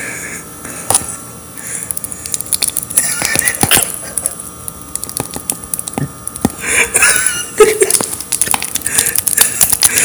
risadas e som estranho

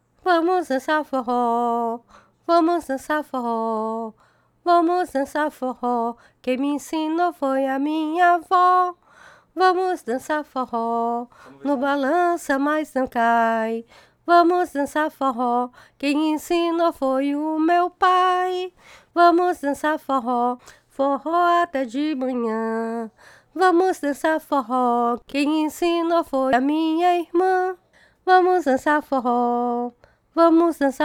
forro raw

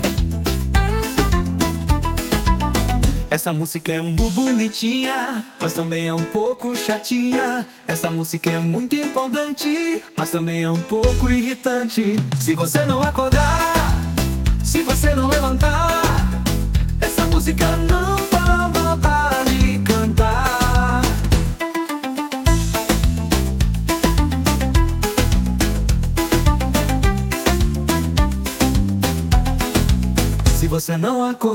Música Empolgante e Irritante

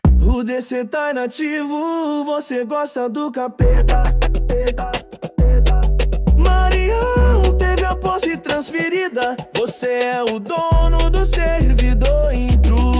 Versão Funk

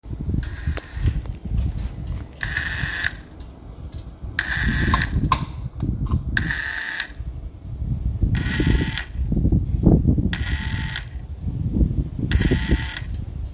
motor megalodon desligando

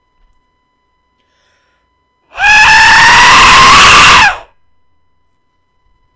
grito da morte

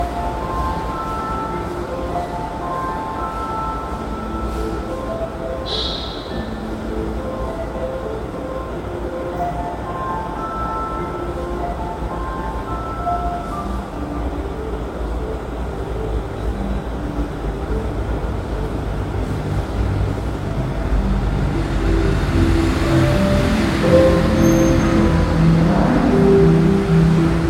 musica legal

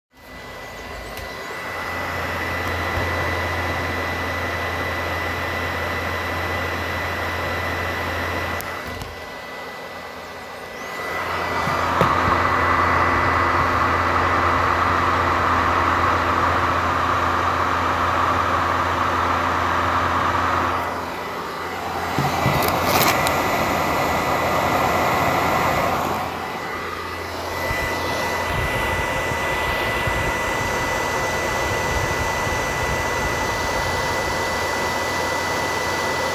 ps4 turbina

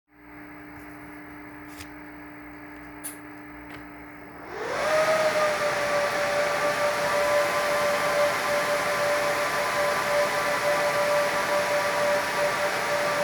Maquina ligando

